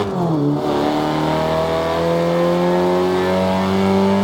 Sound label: second, vehicles; ren meganers